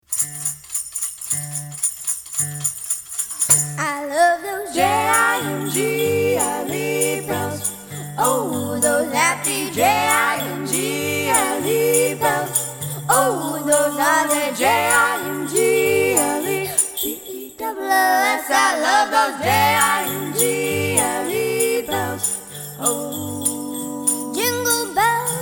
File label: Jingle Bells